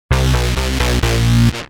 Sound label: Heavy c6, Bass; Industrial